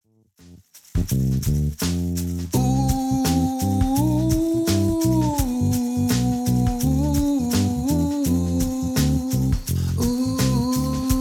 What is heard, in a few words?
Minus Guitar